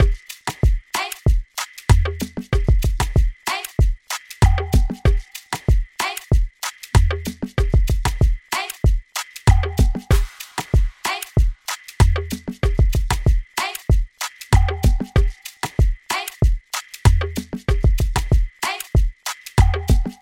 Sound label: 95 bpm modern afrobeat drumloop; bujumbura